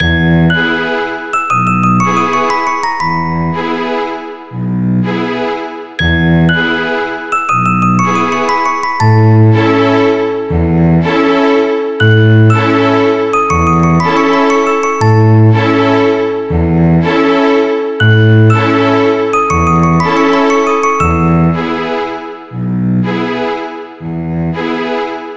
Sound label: Quick Waltz